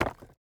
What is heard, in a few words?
phys impact stone; Rust